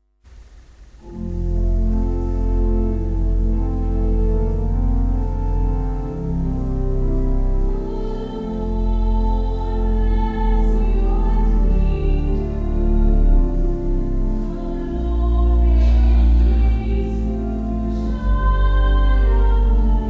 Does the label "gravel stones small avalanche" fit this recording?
No